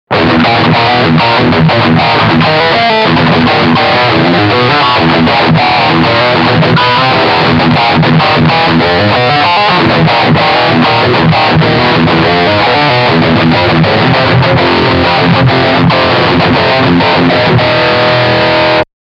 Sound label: Riff Distortion Bridge EMG